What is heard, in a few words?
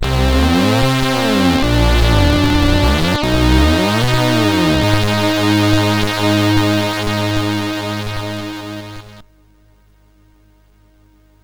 CSG8580R5; sid